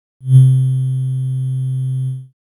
Soft, synthlead

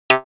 synthlead; pulsar

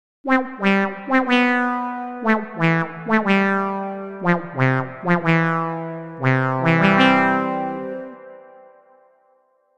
Wah, synthlead; darkplanet

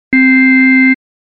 E-Organ

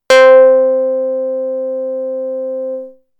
Multiwave Lead

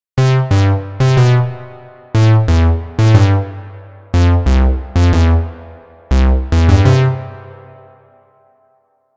Bass Piece, synthlead

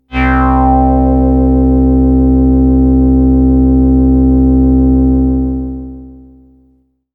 Sun-Sigh C, synthpad

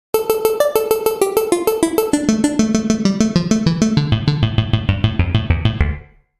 synth lead

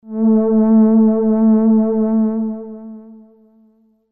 Movie Pad, synthpad

